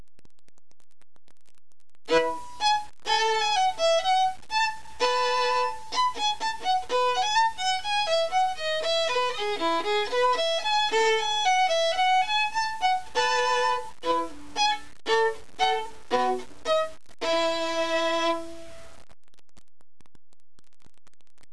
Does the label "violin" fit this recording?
Yes